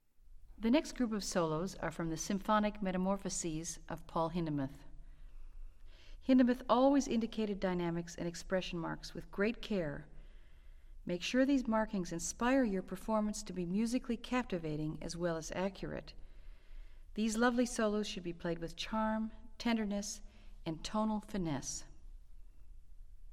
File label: Orchestral Excerpts For Flute; Symphonic Metamorphoses